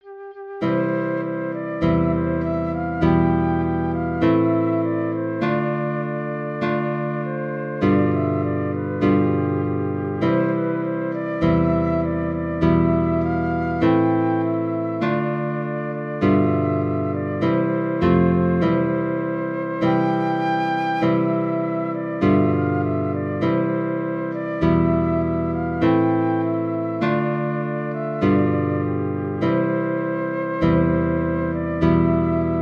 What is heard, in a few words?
guitar flute duet